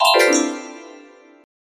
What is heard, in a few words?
30 note all notes